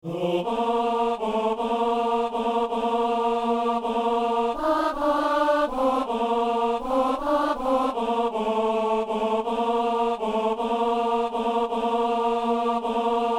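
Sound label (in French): ténor; venez divin messie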